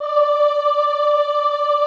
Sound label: OHH D4, Classical Choir; Best Service; Extended Classical Choir